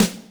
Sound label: snaredrum, Yamaha RM 50